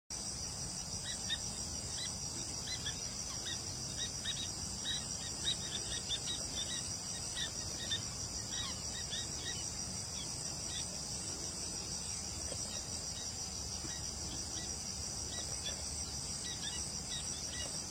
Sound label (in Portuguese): Canto do bico de chumbo de cabeca preta